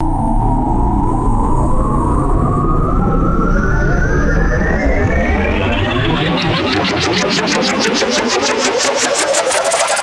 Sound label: synthesized sci fi accelerating wind up tone